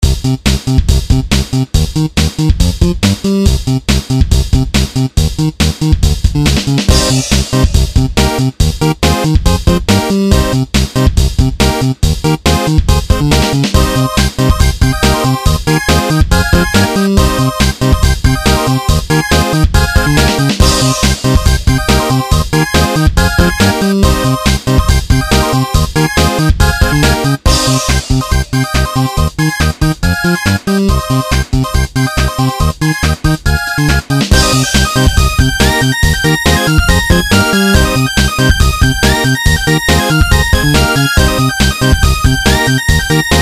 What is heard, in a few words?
detuned